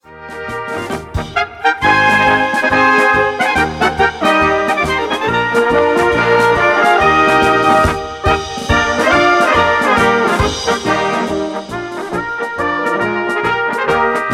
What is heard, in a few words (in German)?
Blaskapelle